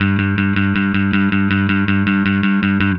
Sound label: Happy Pub Bass; Sonic Foundry (Sony Creative Software); Indie Rock Anthems; Session 05 - Happy Pub